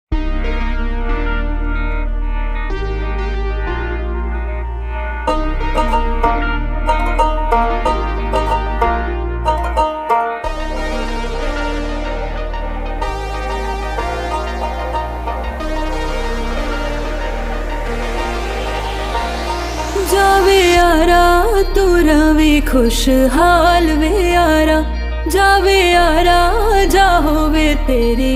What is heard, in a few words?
song of pain